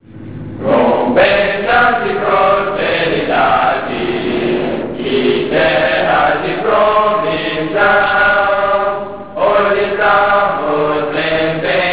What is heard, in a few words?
hymn; brazil; belo horizonte